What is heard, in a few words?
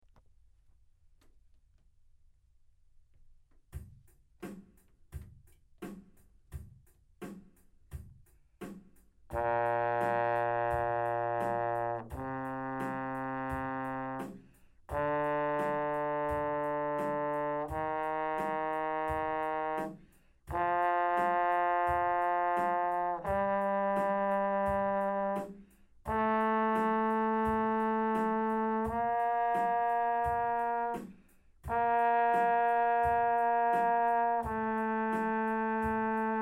Bb major